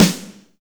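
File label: BAKE SNR, snare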